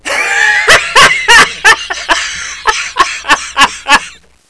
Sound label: cheer; zmParadise